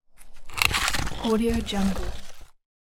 rock scraping on boulder

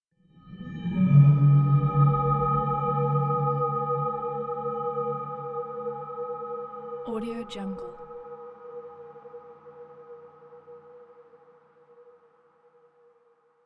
yoga zen art sound; volkovsound

